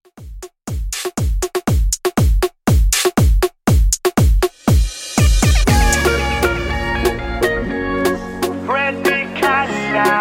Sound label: Moombahton 120 Bpm Clean